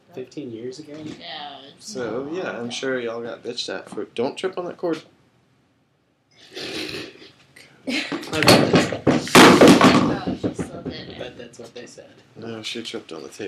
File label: knocks over the mic